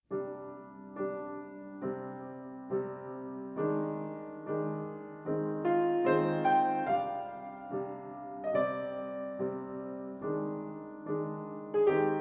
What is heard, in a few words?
Piano Prelude; Piano Prelude 5